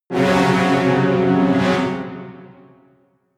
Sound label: jingle brass threatening